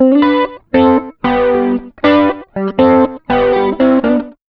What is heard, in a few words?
rock, acoustic guitar loops; USB Soundscan vol.04 - Electric & Acoustic Guitar Loops; AKAI; 120B.ROCK